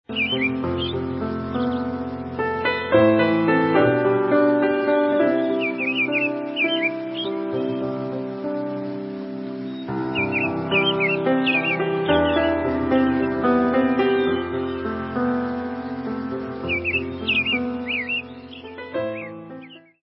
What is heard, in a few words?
relaxing piano music call tone ringtone